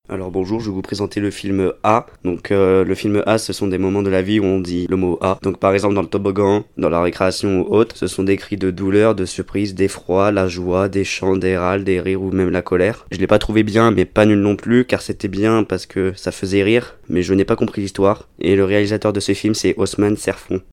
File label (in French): ahhhh; critiques de films